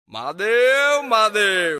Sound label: mahadev notification, Shiv Bhajan Ringtone; महादेव महादेव नोटिफिकेशन टोन; Mahadev Mahadev Message Tone; Pandit Pradeep Mishra Bhajan Ringtone